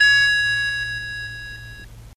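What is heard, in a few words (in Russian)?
игрушечное пианино настроено ти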